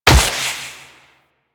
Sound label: Wall Run Step; Warframe; Wraith